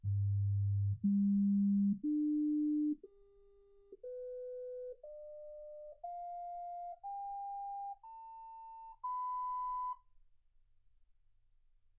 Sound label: sinus tones 48kHz